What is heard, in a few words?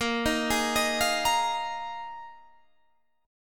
Bb 7th Suspended 4th Bb Guitar Half Step Down